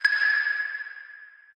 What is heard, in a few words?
chime